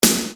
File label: Snare Dr